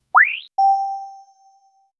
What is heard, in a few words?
scifi start button